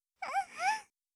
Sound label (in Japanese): unun, breath